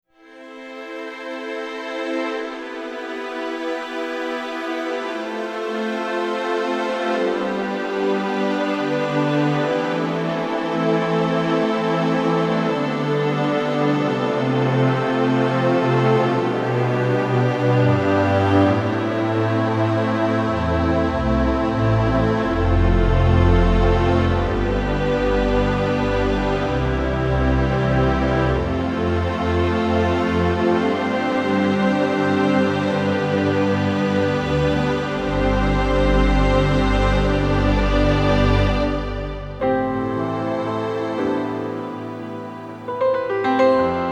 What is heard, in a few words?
Orchestrated